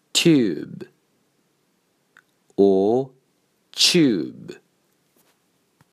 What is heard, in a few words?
Tube